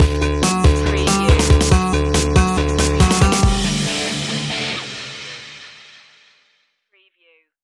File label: power up stinger